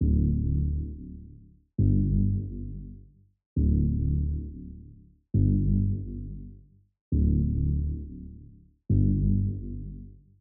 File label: 135 BPM D Min Bass, Loop Stems; Cymatics; 2022 Melody USB Expansion; Axis